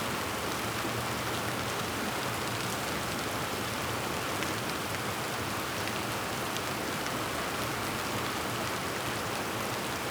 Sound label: Rain, Medium; Noise; Lofi; LIFE